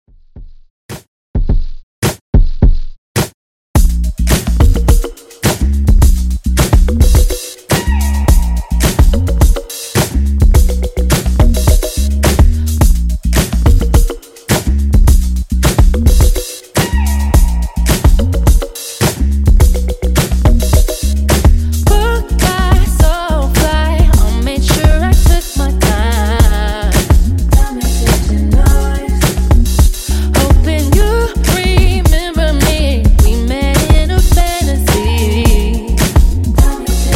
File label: Dj Intro Outro, 106 Bpm